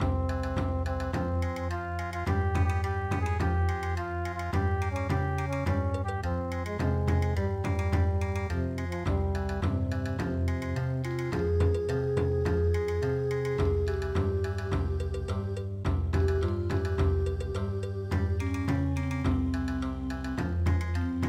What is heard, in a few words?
Dodoitsu Futaagari Bon Odori